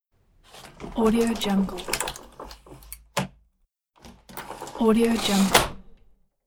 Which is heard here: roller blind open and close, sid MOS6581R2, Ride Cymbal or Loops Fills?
roller blind open and close